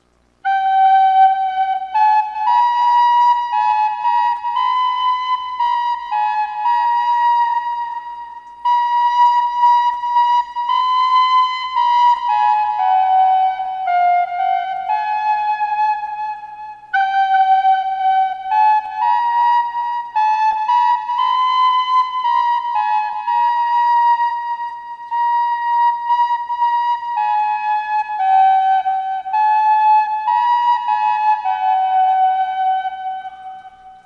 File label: wondrous cross recorder